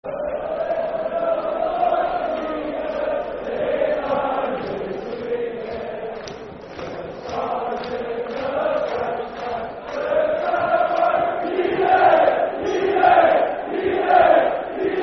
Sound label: Football Chant (Soccer Song) N. Ireland; Away In A Manger; N. Ireland N.i.f.a